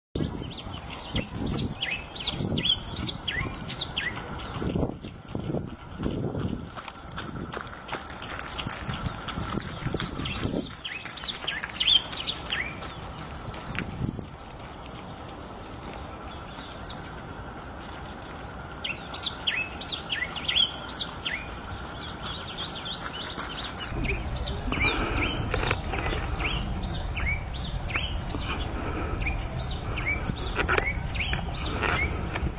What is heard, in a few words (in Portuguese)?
canto do cardeal amarelo